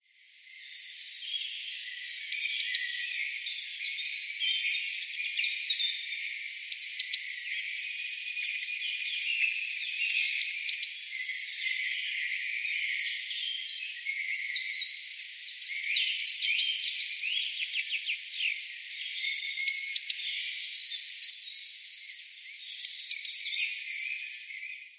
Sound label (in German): blaumeise